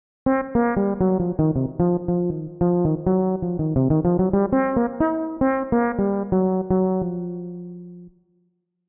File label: Fretless Bass; SS Masterclass; Damper